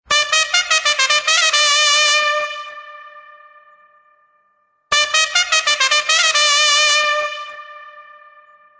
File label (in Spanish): trompeta duelo